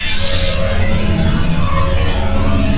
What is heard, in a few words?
laser; dystopia; exodus